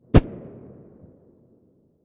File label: glock17 silencer mono far